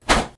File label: Attack Kick